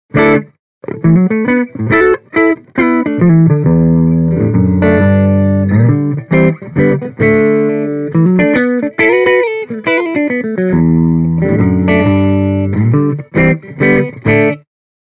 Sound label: Celestion G12T 75 Clean Les Paul